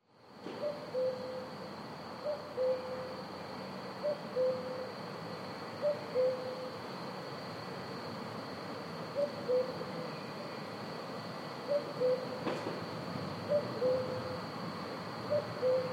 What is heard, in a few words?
cuckoo